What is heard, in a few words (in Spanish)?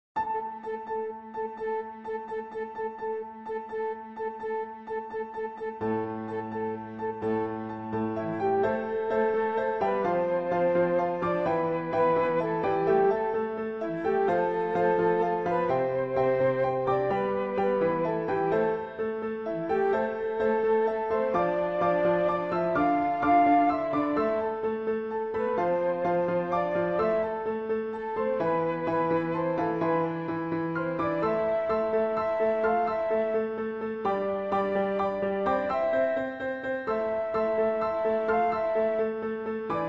flauta facil